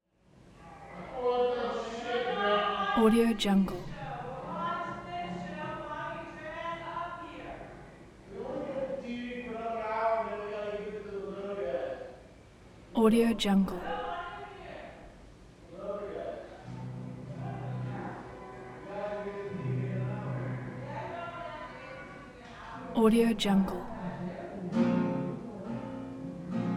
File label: disabled walla mixed 12 step group live room; prosoundfx